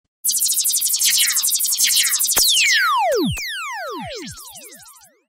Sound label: dancehall lazer